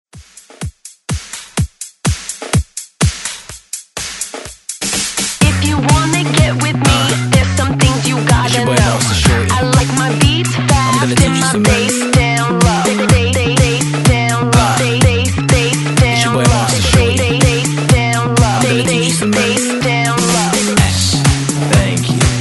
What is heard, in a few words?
redrum 125 Bpm; DJDOLLS